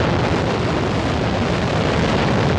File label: Engine End